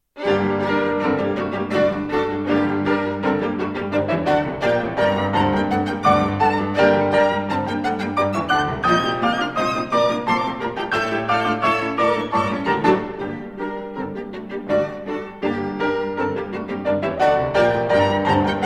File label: Piano Quartet in G Minor; No. 1; Op. 25; Brahms; IV. Rondo